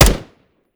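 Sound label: weapon fire player; gmod; viper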